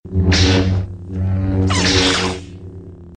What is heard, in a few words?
Laser Saber